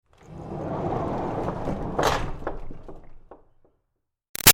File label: Door Barn Rolling Metal Clang Light; Barn Doors